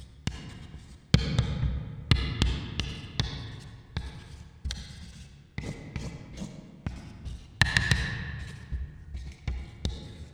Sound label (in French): pas craie; Feed The Beast; Monster